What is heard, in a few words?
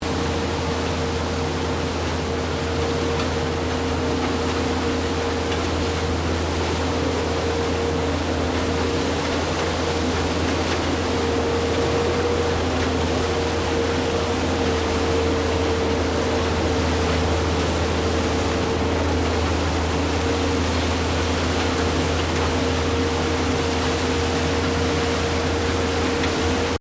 Streetsweeper Small Operating Front Loop, Street cleaning vehicle; Construction Vehicles